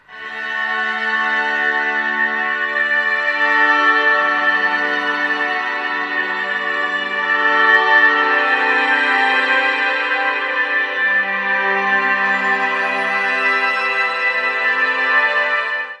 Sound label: spooky violins